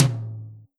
Yamaha RM50 High Tom

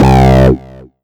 Korg MS2000 Dirt Bass C2

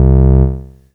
Casio MT-45 Bass I C2

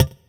Alesis S4 Plus Muted Pops C3

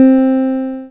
Casio MT-45 Piano C4

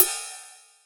Ride Cymbal

